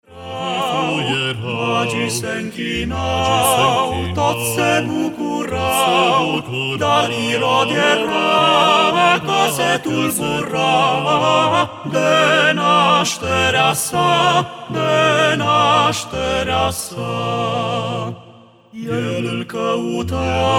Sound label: Voice Quartet; Praznic luminos; craciun